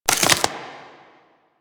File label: Proto Infested Maggot Step; Warframe